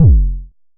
analog tonal, Kickbank; mpk mini presets; Ableton Foundation FreePacks

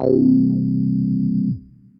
Bass; Love Letters

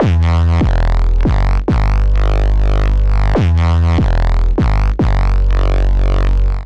Bass E Minor 144 bpm; Ableton; Factory Packs; Skitter and Step; Hyper

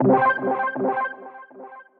Spirit Bell, Synth Rhythmic

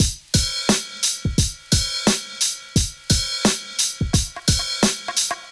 MPE Swang Bap Kit; Drums; MPE Kits; Ableton; Factory Packs; Golden Era Hip-Hop Drums by Sound Oracle

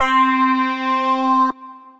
funkadelik stabz, synth keys; Ableton; Factory Packs; Beat Tools

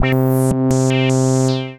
Snake Bass; Synth Rhythmic; Creative Extensions

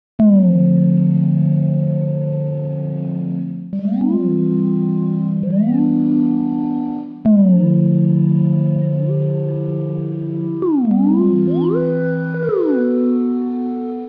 Mellow C Major 98 bpm; MIDI Clips; Tonal; Ableton; Factory Packs; Lost and Found

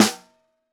SN1 Bottom, snare

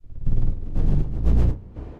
Riser

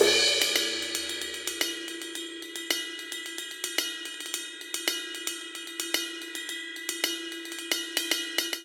Classic Disco Cymbals Only 111 bpm; 4 4; MIDI Clips; Ableton; Factory Packs; Drum Booth

